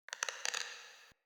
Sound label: Cork Creak; Puzzles; Neathuns